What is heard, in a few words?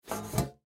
Popcorn Tin Lid, Popcorn Tin Lid Large Pick Up Carpet; Food; Foley; Household; Blastwave